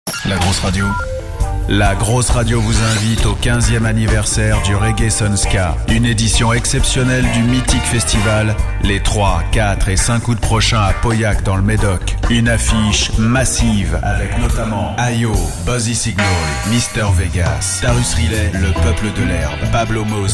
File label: spot reggae sun ska